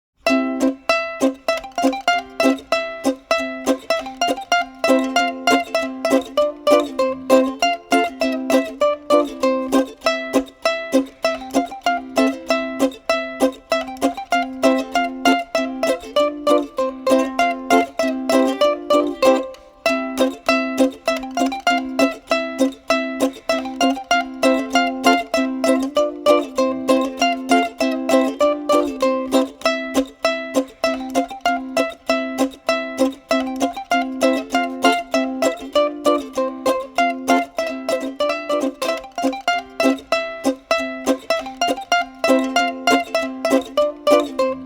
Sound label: Instrumental, Ukulele; Ukulele Ile Cocuk Sarkilari